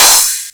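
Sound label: distorted hi-hat open, drum machine; DR.ACCESSOR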